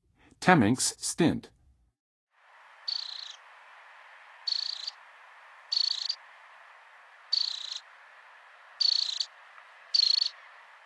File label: Temmincks Stint, Birds of North America; Western USA; Western USA Songbirds